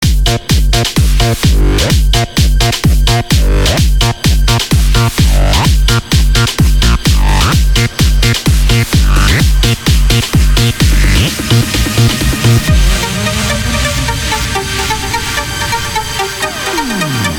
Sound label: jingle; hitparty